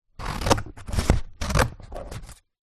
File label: office scissors cut greeting card; zapsplat